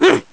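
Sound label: Salute, gameplay sounds; Link; zelda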